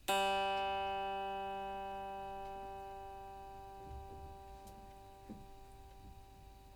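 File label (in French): Electrique sol, guitare électrique stratocaster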